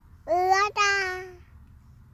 Water

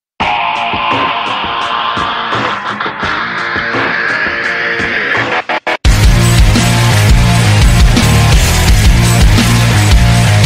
intro song; AthleanX

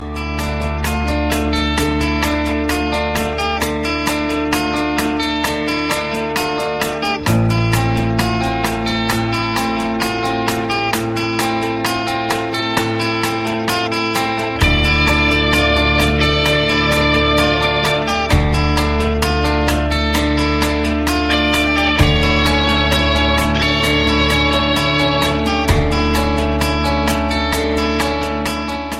Light Music

